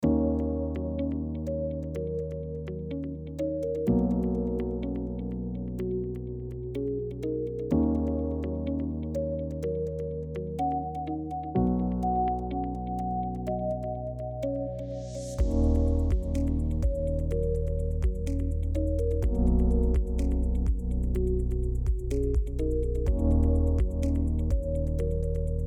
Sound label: light minimal background